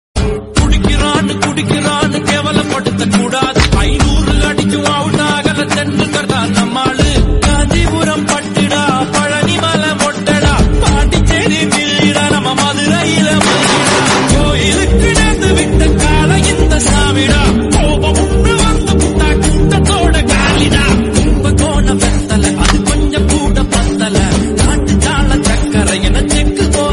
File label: tamil mass cut songs